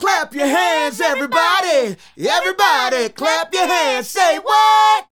Male Group, Hip-Hop and RnB Vocals

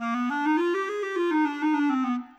Clarinet; Sonic Foundry (Sony Creative Software); Orchestral VOL-2; Modern 06

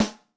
Snare, Yamaha DTXpress-II

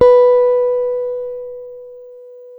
B 3 JAZZ GTR; Univers Sons; Jazzistic

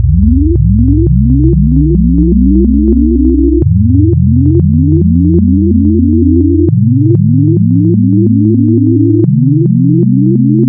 Add Sine 3N B, wavetables; Architecture 32

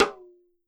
MIXED DRUMS, World Instruments

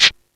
SHAKER, ELEC PRCS; East West; Global Sounds

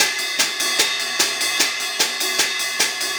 Hi Hat and Ride; Combo

